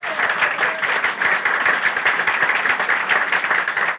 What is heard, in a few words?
applause